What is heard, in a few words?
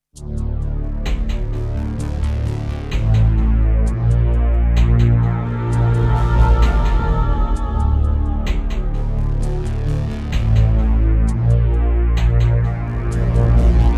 Midiplus MiniEngine USB; Descent II - Briefing